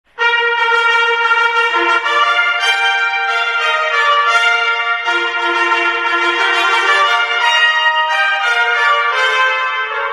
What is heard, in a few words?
Fanfare